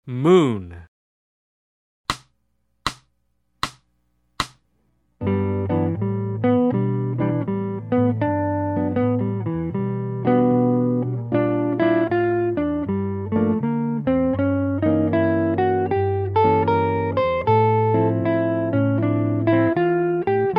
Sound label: Jazz